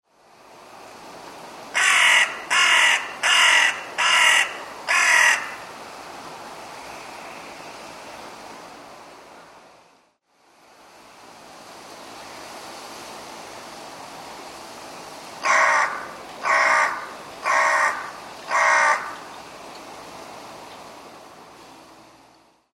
hashibosogarasu; ハシボソガラス; 地鳴き; 2005年3月19日 静岡県袋井市

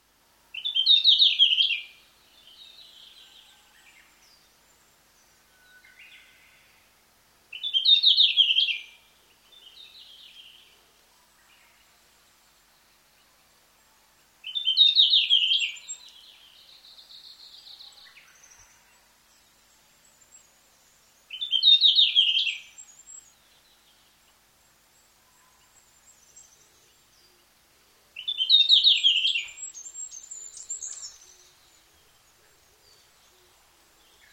ruribitaki; ルリビタキ; さえずり; 2006年6月3日 山梨県鳴沢村

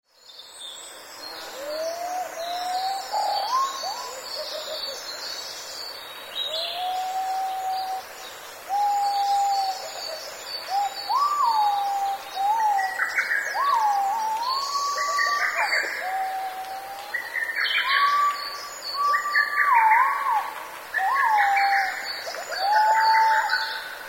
aobato; アオバト; さえずり; 2022年6月3日 静岡県富士宮市

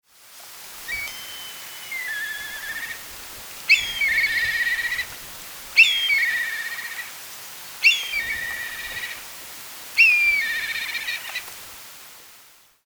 tobi; トビ; さえずり; 2026年2月24日 栃木県栃木市

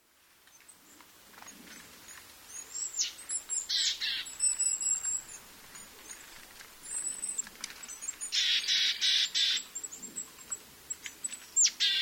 kogara; コガラ; さえずり

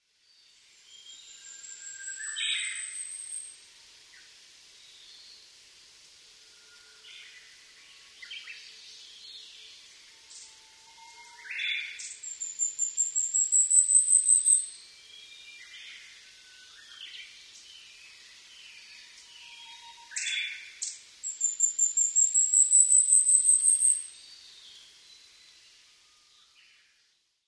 yabusame; ヤブサメ; さえずり; 2012年5月12日 神奈川県横浜市

